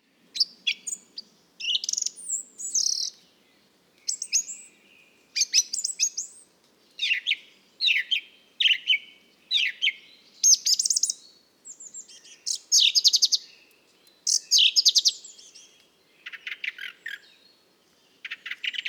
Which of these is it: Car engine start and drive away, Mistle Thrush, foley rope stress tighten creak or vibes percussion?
Mistle Thrush